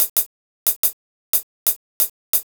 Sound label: Hi Hat